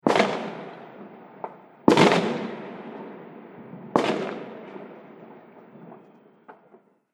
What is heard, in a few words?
Fireworks 3X Explosions With Reverberation; Firework Sound Effect; Andrew Fleming